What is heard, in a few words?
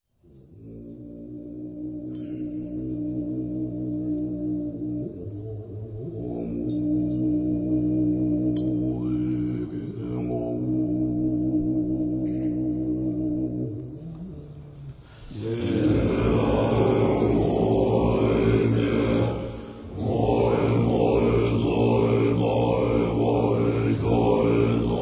Buddhist Chant; World Sounds